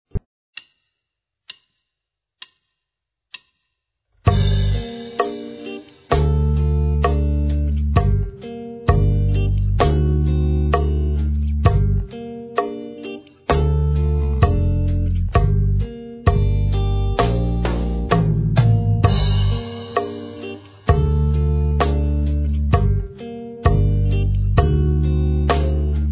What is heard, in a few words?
Drumset; First Steps In Drumset